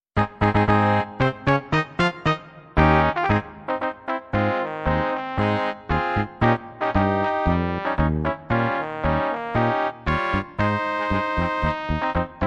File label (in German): Marsch